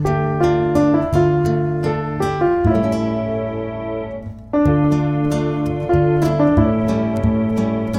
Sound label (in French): instr